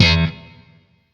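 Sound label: Shawzin slap E, octave two; Warframe; Sentient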